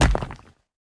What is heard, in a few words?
Weapon Stone